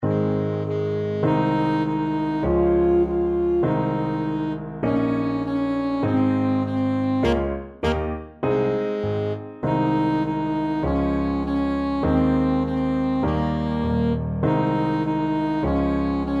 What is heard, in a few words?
twinkle twinkle, saxophone